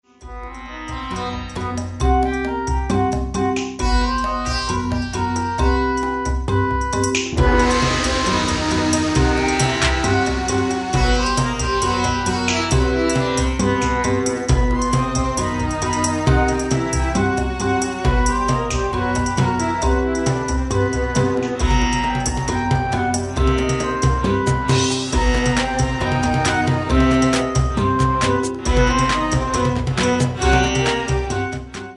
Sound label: Eastern Dance